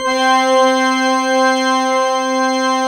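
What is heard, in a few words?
pipe C3, organ; EGLISEORG; POPPIPE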